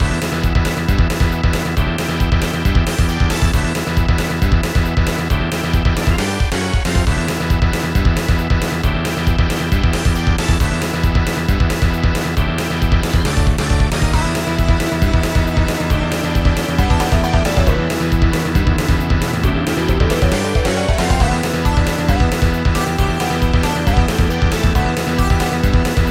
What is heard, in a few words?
Battle; Shin Megami Tensei; PSX